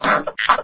antlion grub alert; video games; npc; source engine; ep2

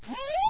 he hunt, houndeye; source engine; hl1; video games

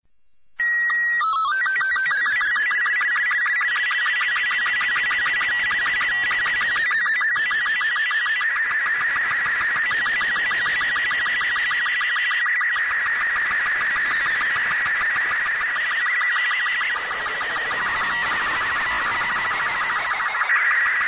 ambient; Portal 2; Source Engine; video games

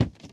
plaster, footsteps, run

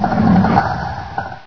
ichy die; Source Engine; bshift

